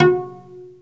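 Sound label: SOLO CELLO, Synclavier Strings; ILIO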